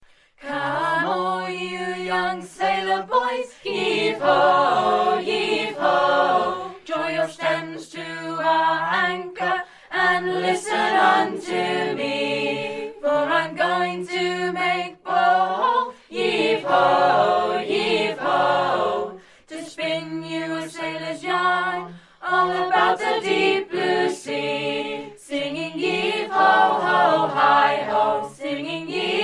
Yeave Ho, Acappella

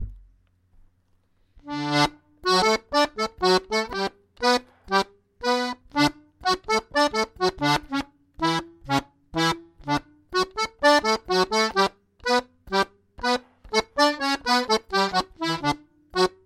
Four Hand Reel slow

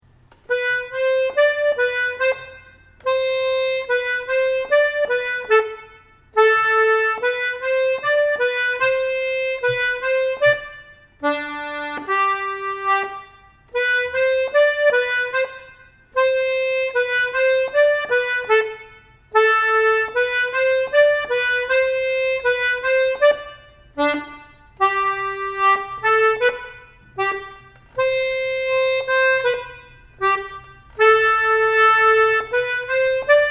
slow Shepherds Hey G maj

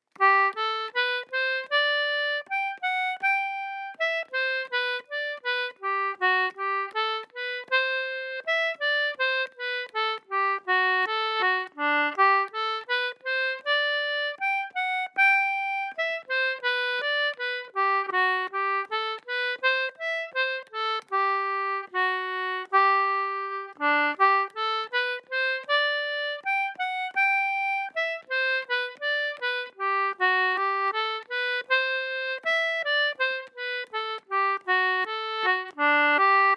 slow Country Dance

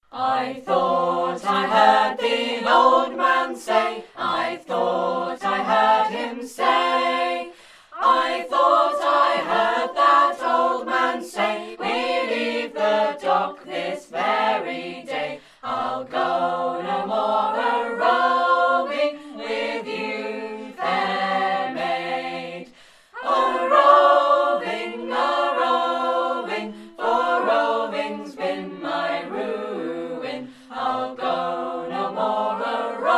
A Roving, Acappella

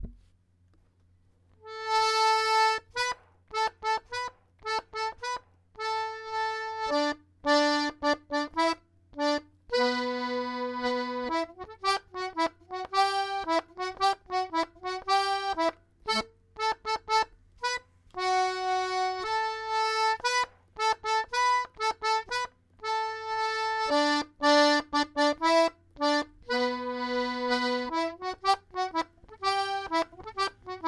Ponytrot Polka slow

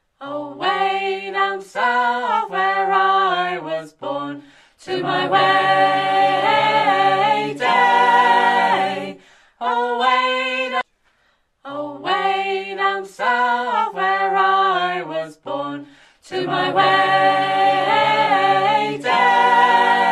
harmony A Long Time Ago Acappella

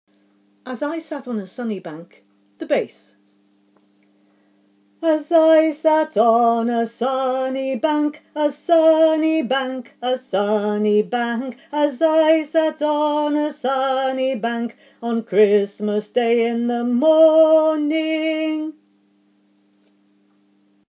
As I Sat, bass

